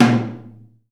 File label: TOM 2L R, DRUMKIT